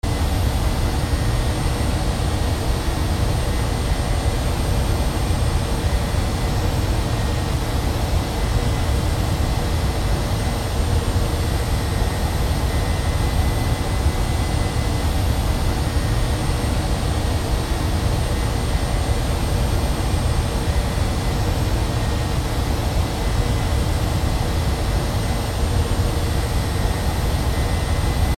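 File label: noisy interior atmo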